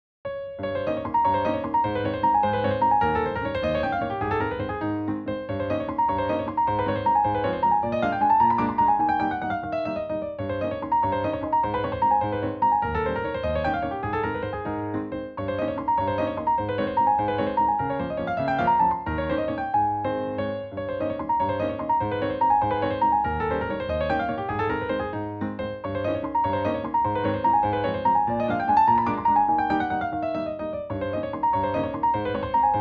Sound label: Waltz, chopin